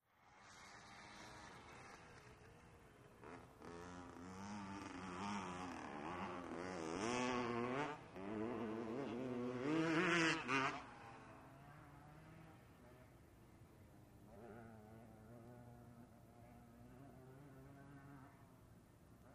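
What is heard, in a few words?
Dirt Bikes 2 Over Terrain, 2 stroke bikes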